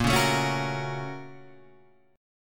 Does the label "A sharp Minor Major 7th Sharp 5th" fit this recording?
Yes